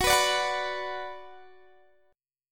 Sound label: Gb Suspended 4th Sharp 5th, Guitar, Drop D; down; chord shape